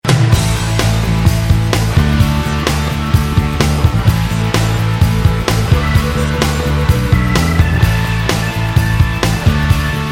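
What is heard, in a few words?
trance piano; electronic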